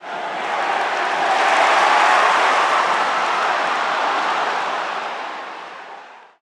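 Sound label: Basketball Crowd Cheer; SFX Kit; Crowds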